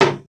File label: wood hammer; wood metal SFX